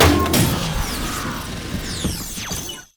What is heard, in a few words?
emote death; LoL; blitzcrank iblitz